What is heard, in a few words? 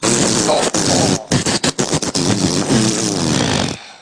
fart